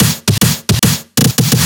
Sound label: 145 BPM drum fill; Singomakers; Infected Psytrance